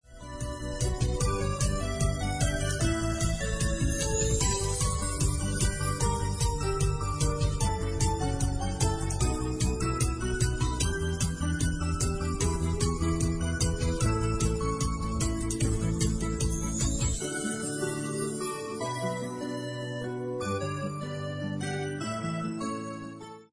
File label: bgm